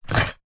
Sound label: foley cardboard box small containing metal screws and other pieces sudden movement; zapsplat